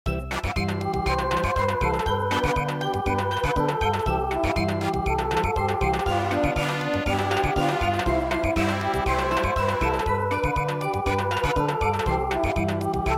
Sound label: Samba